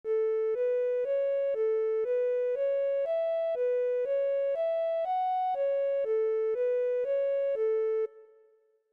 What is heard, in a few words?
recorder